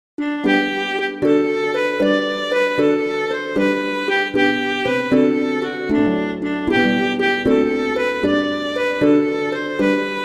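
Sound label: I Saw Three Ships, Christmas flute quartet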